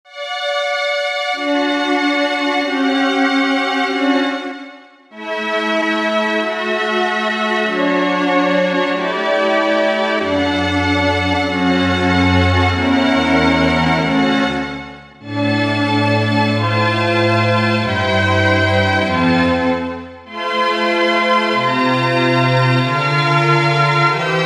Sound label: strings